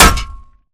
Imp Bullet Light Ballistic Solid Metal

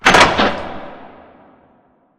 Robot Arm Track Stop Close; Boomtown; Titanfall 2

